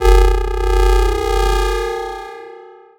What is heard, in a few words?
combat drone texture scan; BluezoneCorp